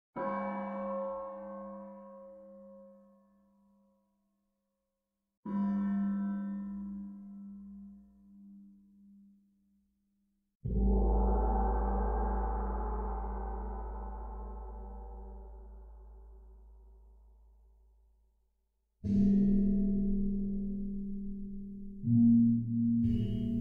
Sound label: Percussion; Age of Conan Secrets of the Dragon's Spine; Ocean of Sand